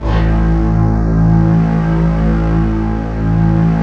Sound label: SYN, Roland, digital